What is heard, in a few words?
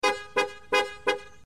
the horn